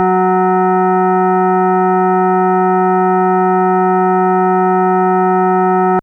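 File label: FM Sawtooth DX100 F#6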